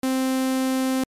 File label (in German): saegezahn, synthesizer basics